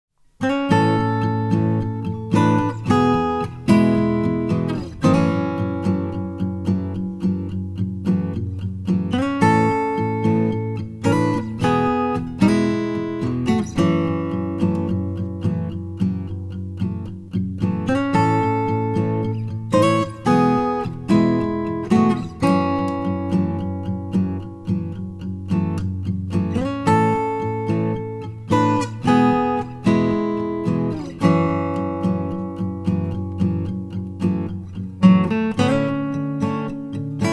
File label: Bright BG; Bicycle